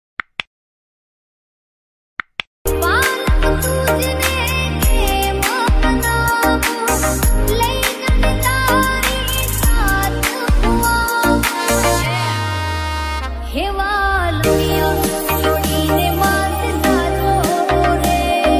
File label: mobile ringtone; jay dwarkadhish